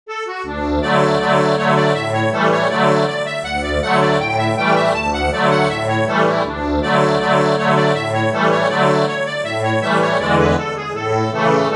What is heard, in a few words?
accordion, sheet music